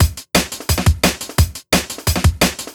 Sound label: DNB BREAK